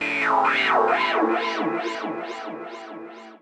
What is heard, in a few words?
KEY SHOT TEKNO 140 A; Techno2; Singleshots